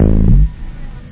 BASS; PhatTrax; FASCINATE

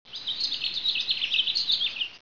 Bird Chirps; 11khz; Triazzle 1.0 Demo

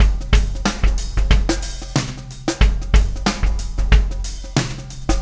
Funk-Shuffle Beat; Sonic Foundry; Drum Sugar

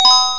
2 BELL; ActionCat

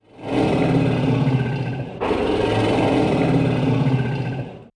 lion roar

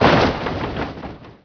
SWIPE, Prangs

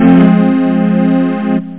Lose Game; Thermo; Chemistry

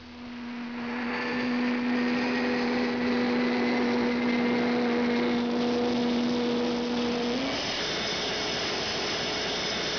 Hornet MIL Engine; F/A-18 Hornet 2.0

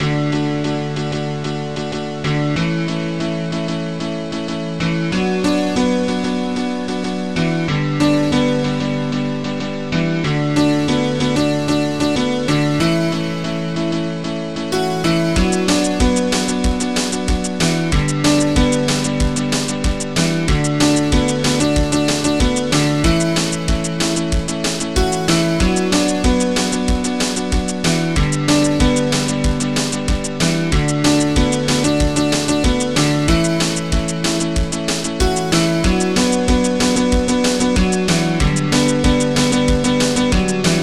piano plinger; AmigaTimes; module

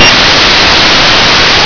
Concert Burst

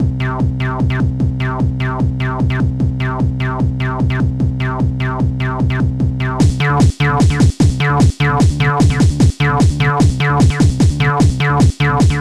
hip jump; Aminet 21; GTI - Schatztruhe

